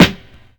wet snare sound f sharp key, snare one shot tuned to the F# note. Loudest frequency: 1398Hz